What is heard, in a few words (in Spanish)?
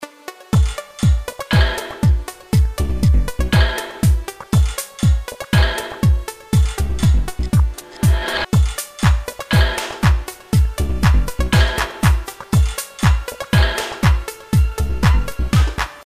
tono chinesky